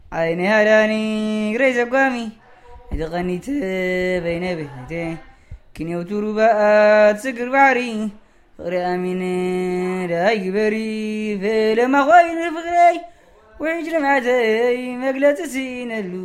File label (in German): Gesang